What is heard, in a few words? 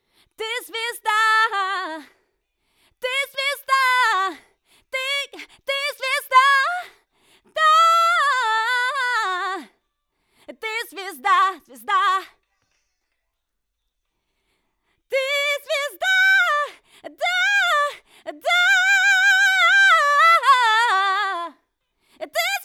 Soul